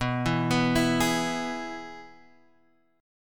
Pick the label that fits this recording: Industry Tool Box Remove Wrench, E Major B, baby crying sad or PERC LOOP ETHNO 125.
E Major B